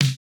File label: no effect j city pop 1980 snare